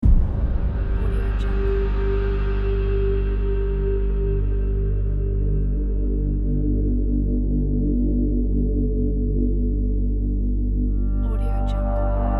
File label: dark drone ambient